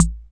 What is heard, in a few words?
PERC, Solid Analog Kit; Hip Hop; STYLE KITS (Hip Hop, Etc)